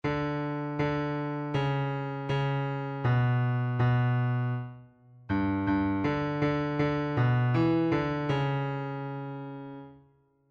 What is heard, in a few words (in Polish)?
oto Pan Bóg przyjdzie bas midi